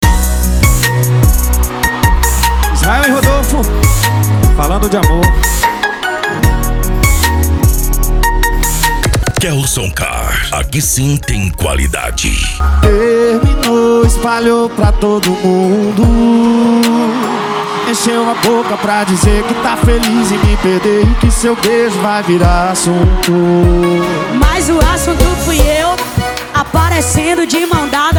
sertanejo e lambadao

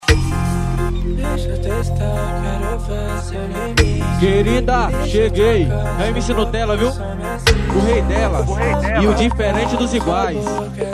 piseiro

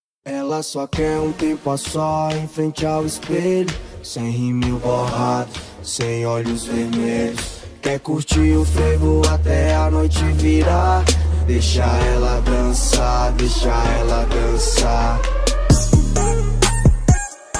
bass house e rap

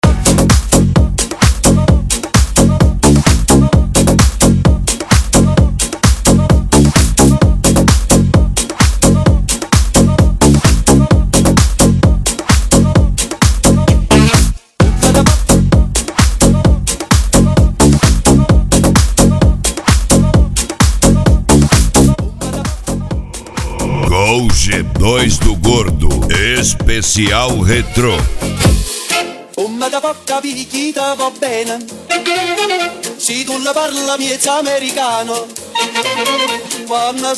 especial retro; gol g2 do gordo

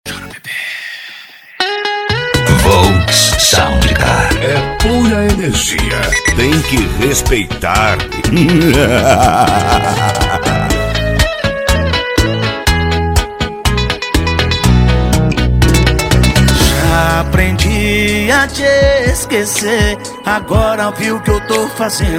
sertanejo e pisadinha; cd volks sound car

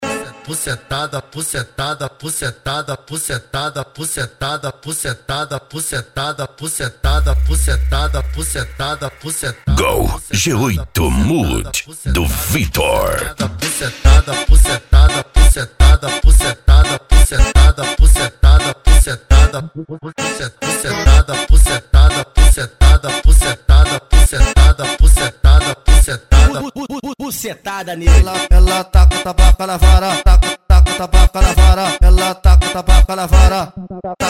funk ritmado

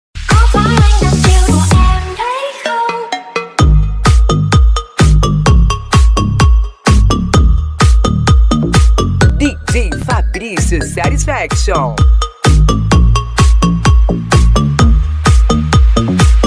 deep house e pisadinha; le point som e acessorios; funk

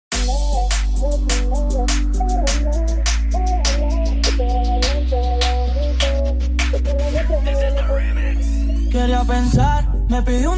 dale reggaeton; cd bazar automotivo

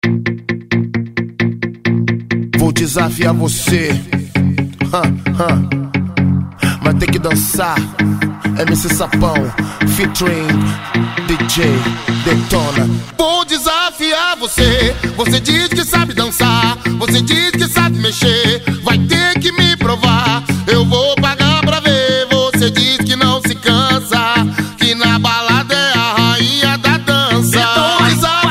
batida funk

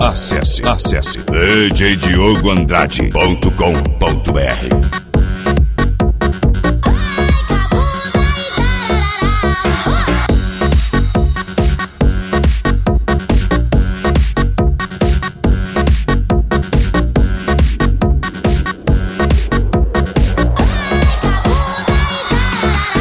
eletro funk

